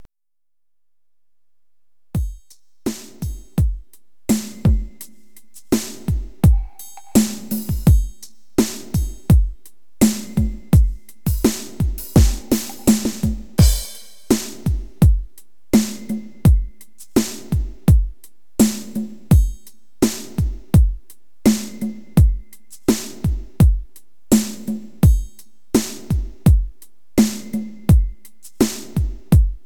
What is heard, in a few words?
beat